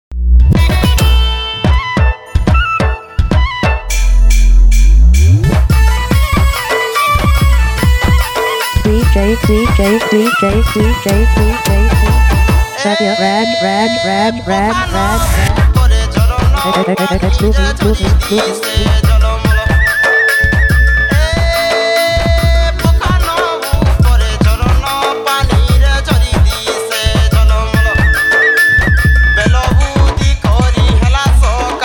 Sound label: Sambalpuri Dance Mix